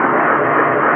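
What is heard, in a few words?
Booster Interior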